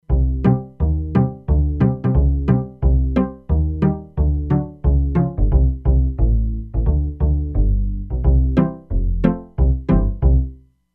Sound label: Pizz Bass, E-mu Proteus-3; Factory patches